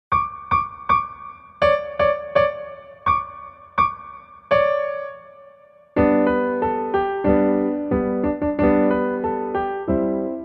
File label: JingleBells